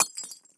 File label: glass hit